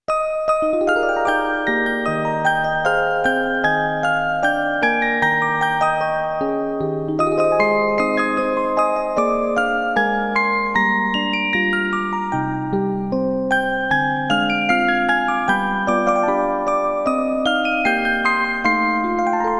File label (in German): Orgel